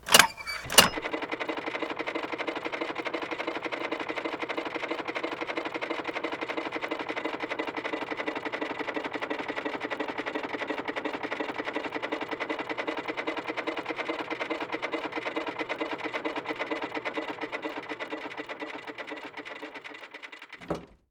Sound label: Drain Lever Power On